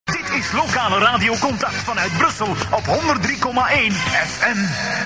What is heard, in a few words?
jingle; Contact Brussel